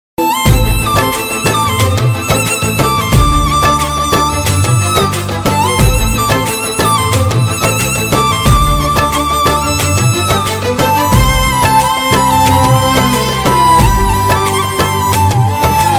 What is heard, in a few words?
love theme instrumental